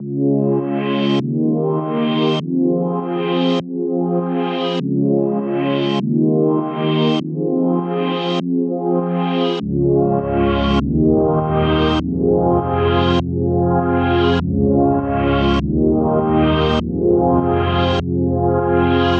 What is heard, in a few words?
songstarter 100 Fm; Slate; SD PASADENA Pop Sample Pack